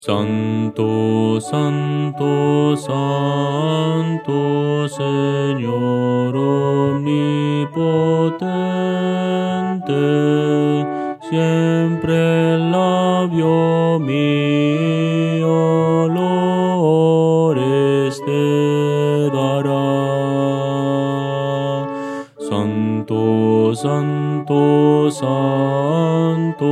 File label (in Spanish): contralto